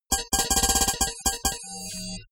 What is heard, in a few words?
typewriter